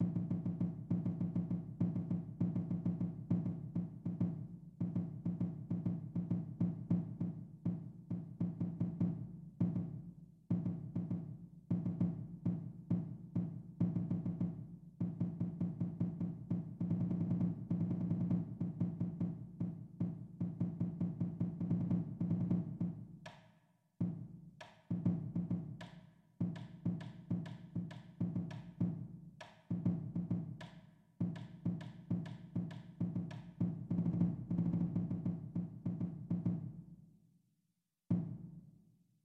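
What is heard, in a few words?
drumline bass drum pit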